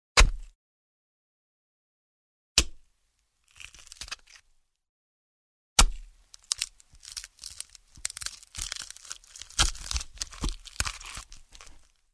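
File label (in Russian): удар человека ножом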